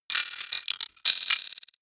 sci-fi electromechanics small stutter tonal

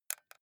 console controller xbox joystick press

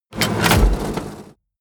tank medium gear shift

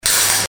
electro welding

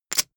poker chip stack rattle short

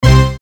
indigo tone

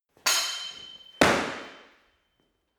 sword hit sword tackle

